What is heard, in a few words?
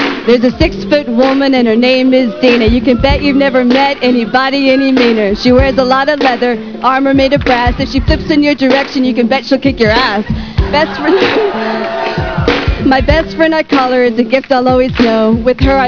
Rap